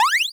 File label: jump, Retro Sounds 32-Bit